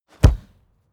football kick

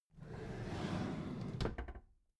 packed drawer smooth clatter open soft

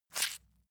leather impact mud hard squeeze

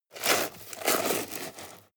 shovel dig

